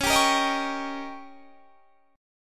Eb 7th

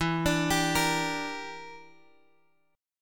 E Minor 7th Flat 5th E, Guitar DADGAD

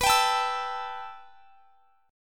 B 7th Suspended 4th Sharp 5th; B7sus4#5; strummed

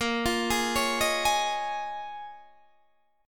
Bb Minor 11th Bb down Guitar DADGAD

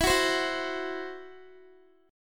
E Suspended 2nd Sharp 5th, Guitar; Esus2#5; strummed

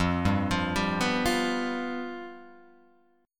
F Suspended 2nd Flat 5th, Guitar, Open G

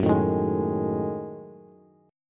Gb Minor 6th Add 9th Gb down Bass; strummed; JGuitar

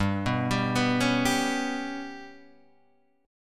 G Major Flat 5th Guitar Standard, chord; GMb5 arpeggiated; JGuitar

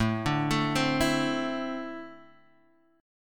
E 7th Suspended 4th Sharp 5th

